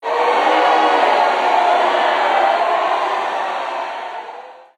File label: crowd boo